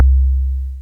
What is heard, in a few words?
Kick, Drum Machines